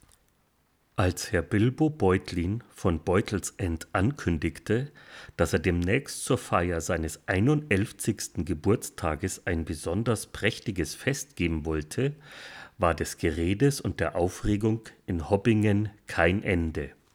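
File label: SE Electric DCM6 SM58s Vocals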